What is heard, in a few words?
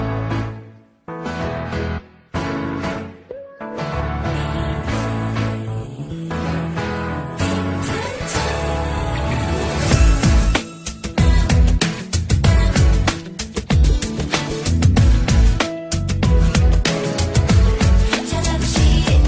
baby instrumental